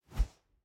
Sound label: Fighting Woosh; SoundsCrate